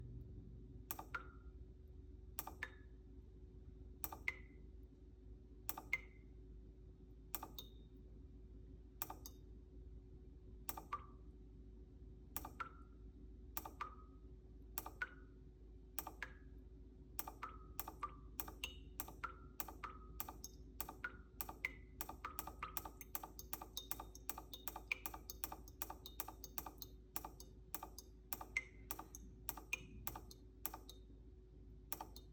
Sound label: Drip Click Sound